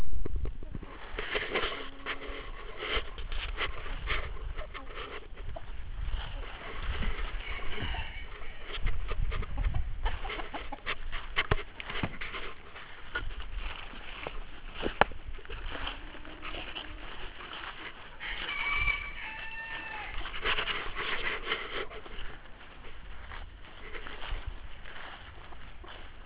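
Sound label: Background Chickens Rooster; scary sound effects; making scary sound effects with sony's sound forge audio studio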